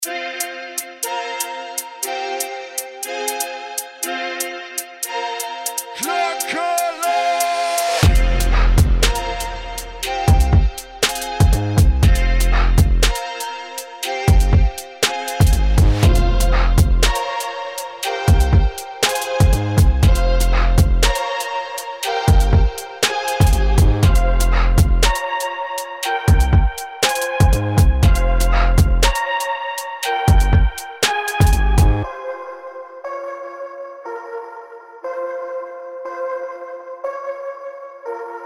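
mod20, This bass sound with two layers is a reminiscence of the legendary KORG MS20: a mean and sharp wonder weapon.; CINEMATIQUE; KLANG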